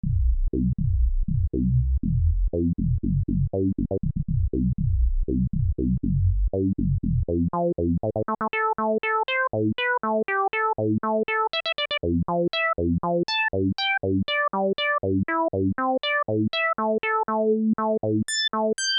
roland v-synth xt filter 2x tb filter ser q075 rf000